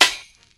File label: prominent snare drum sample E key